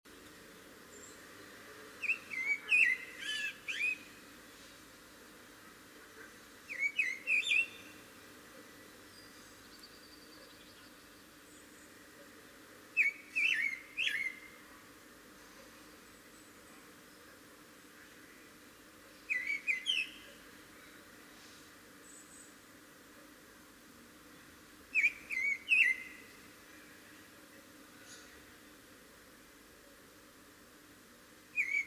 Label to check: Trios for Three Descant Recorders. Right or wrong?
Wrong